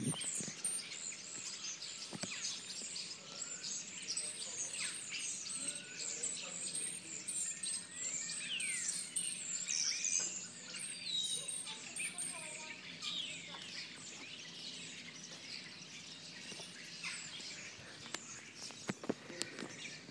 chattering starlings, co kerry

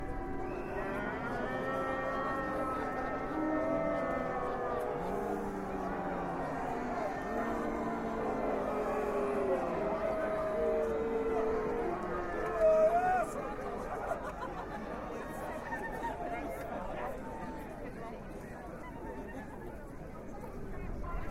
booing and bleating at lib dem protest sheffield

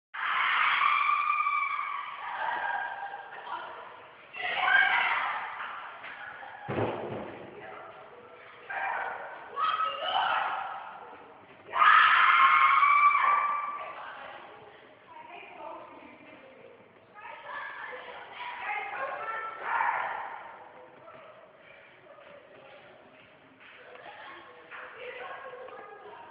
screaming outside my door

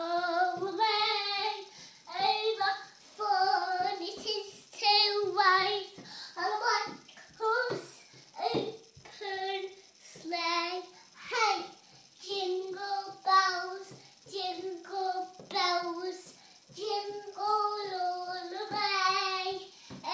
smallgirl mad half hour jingle bells

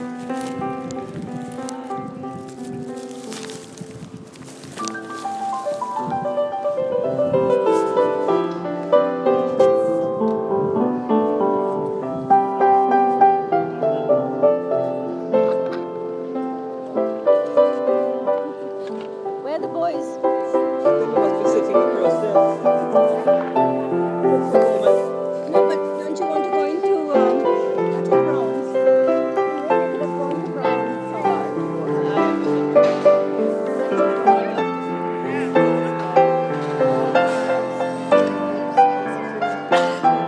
piano eavesdrop phone drop

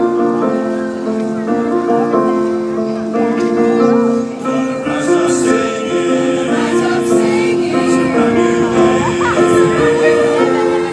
community choir

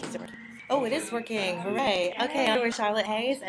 training music

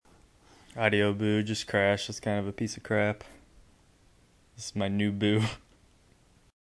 crash

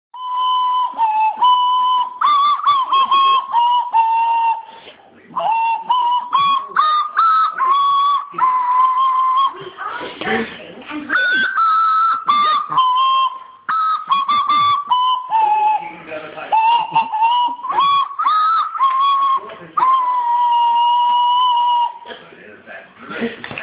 the coventry carol on swannee whistle

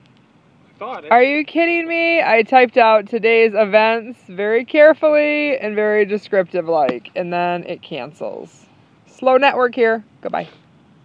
ugh

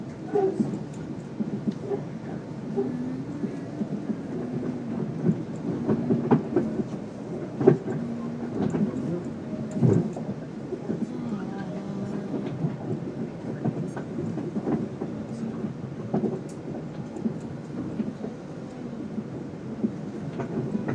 train journey ambiance

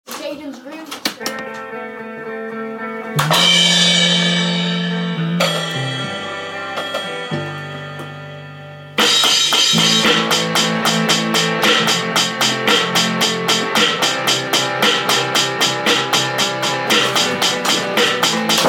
drums

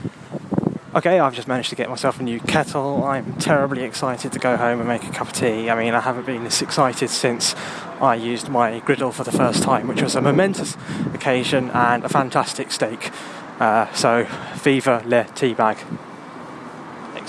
kettle

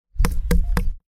tapping

